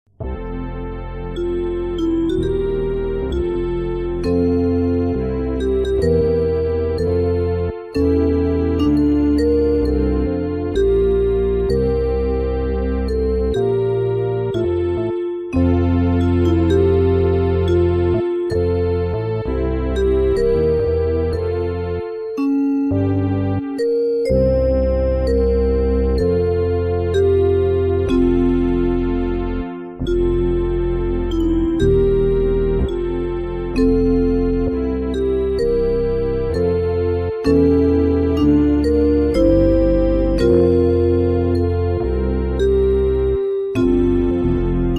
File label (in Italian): solo musica